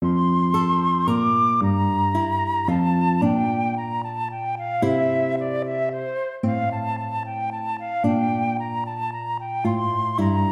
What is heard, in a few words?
minuet major